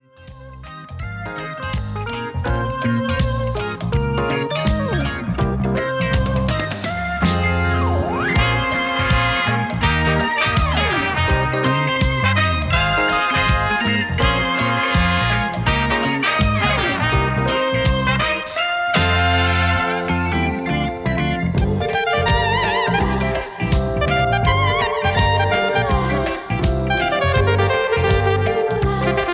drums, instrumental

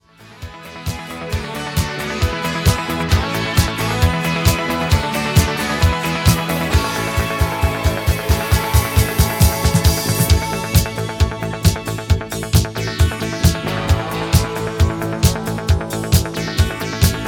drums records instrumental

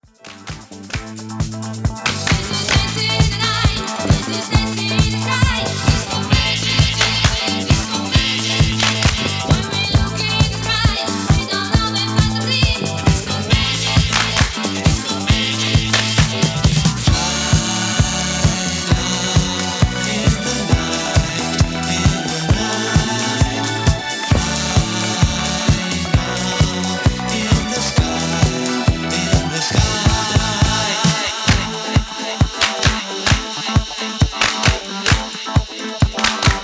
drums, disco

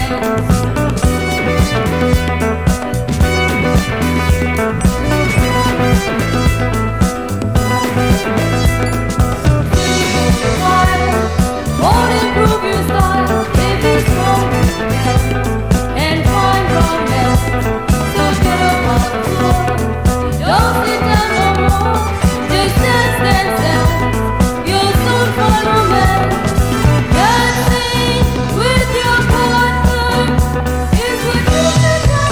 Disco Version

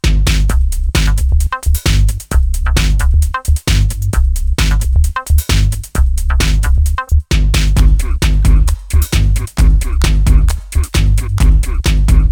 Motor Dub

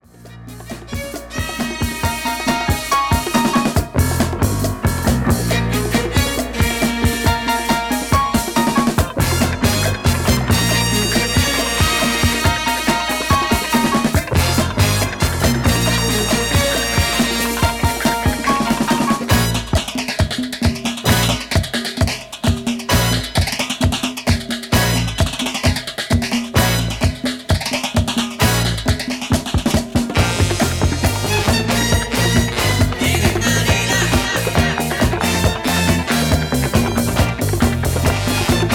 drums records manila sound

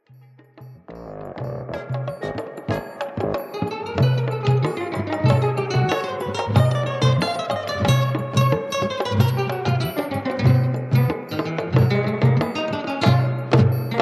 Funky

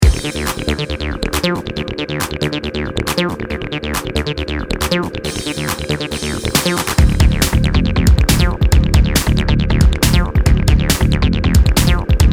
What Happened To Peace Instrumental

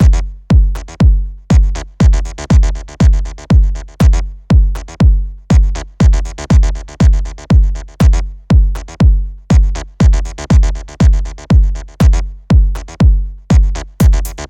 PERCUSSIVE; wave synth pop

